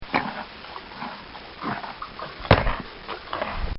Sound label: A Horse Chewing A Carrot; Horses (Sound Effects:Animals)